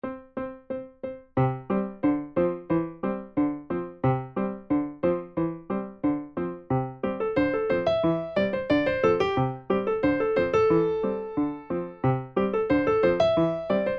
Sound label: Sailboat 180 beide